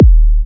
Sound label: Minimal Kick, Drums, Kicks